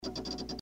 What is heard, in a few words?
fast 5 clicks